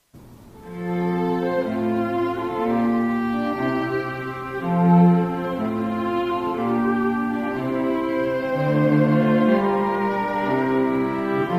Water Music Air, string quartet; Air to Water Music; Handel

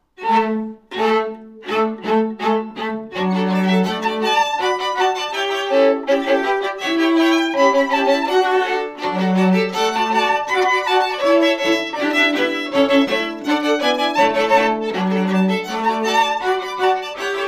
Polka, string quartet; Bahn Frei Polka; Richard Strauss

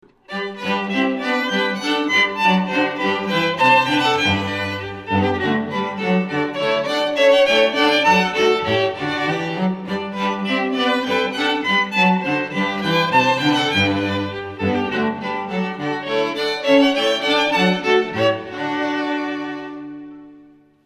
Water Music Bouree, string quartet; Water Music Bourre; Handel

